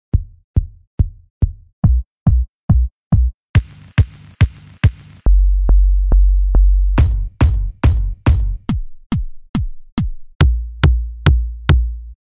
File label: Kick Drums; کیک درام